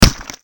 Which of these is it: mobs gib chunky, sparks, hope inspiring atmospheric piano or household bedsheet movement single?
mobs gib chunky